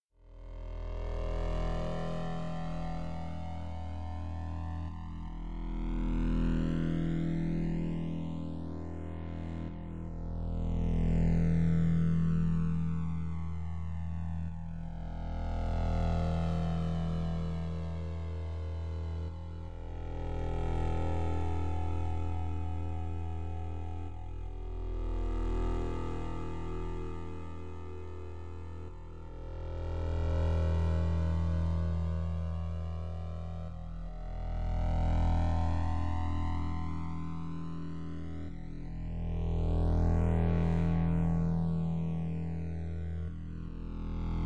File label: Em100 Groove; Joans Groove